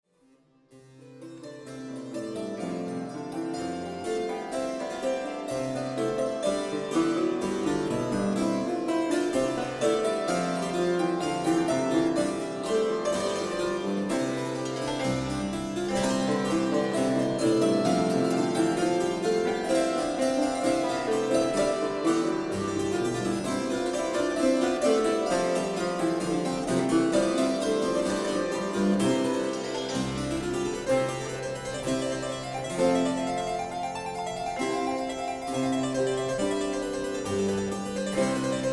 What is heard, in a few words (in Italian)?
Tema e variazioni; Merula; Musica Fiorita